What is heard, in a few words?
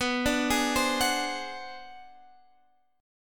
B Minor 6th Flat 9th B, chord